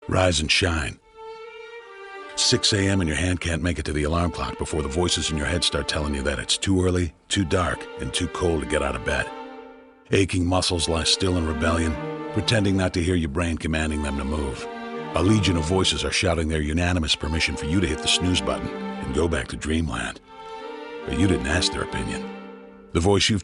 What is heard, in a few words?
Motivational Alarm